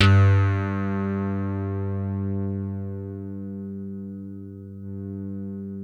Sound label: DIGI RHODES; Sound Gallery - Charged